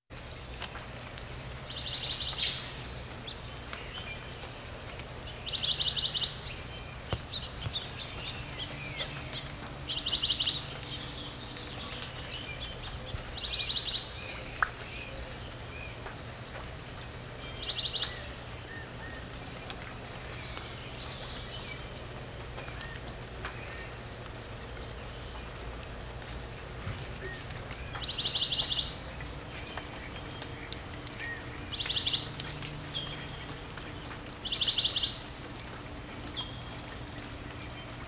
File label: Urban Backyard